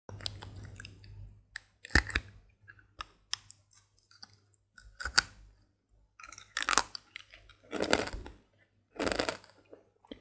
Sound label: Eating Smarties; اسمر خوردن اسمارتیز